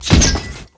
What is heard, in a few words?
GrenadeShot